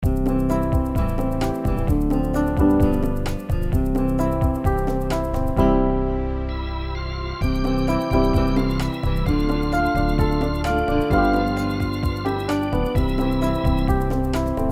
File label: instrumental; New Hope Music